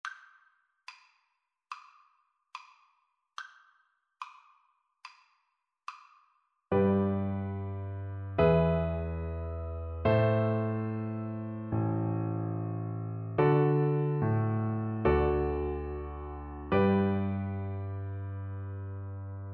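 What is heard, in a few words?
prelude d VLC karaoke, Cello; carcassi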